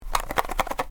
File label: shake small box